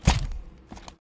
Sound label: melee; EMXArms